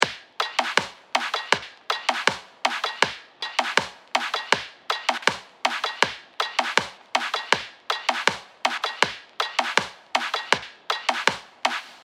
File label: Vintage Drums